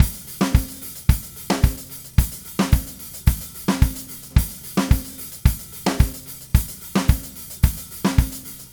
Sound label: Red Maple Groove 110, DW Red Maple Kit